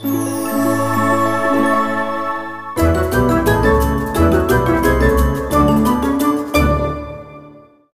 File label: Minigame Monkey Win